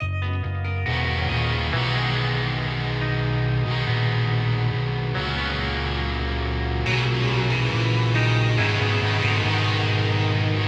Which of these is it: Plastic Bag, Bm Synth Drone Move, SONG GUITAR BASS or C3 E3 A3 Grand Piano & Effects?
SONG GUITAR BASS